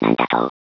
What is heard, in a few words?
pain